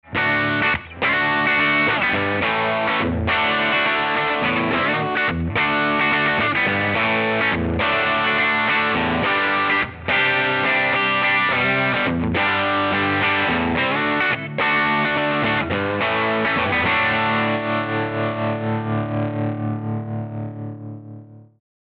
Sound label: GT-10 Vintage Crunch